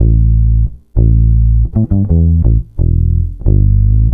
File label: bass